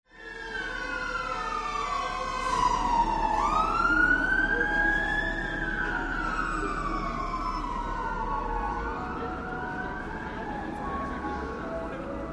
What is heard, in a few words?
fire engine